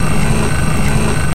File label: Flasher Lights Motor, emergency vehicles